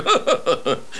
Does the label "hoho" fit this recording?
Yes